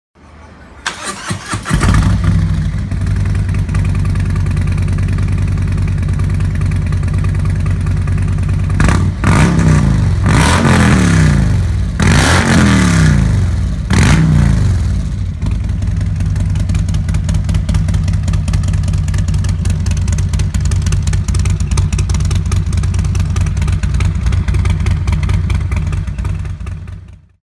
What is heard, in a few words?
exhaust, motorcycle